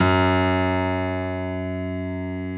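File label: piano F#1, GRAND; S-GRAND PF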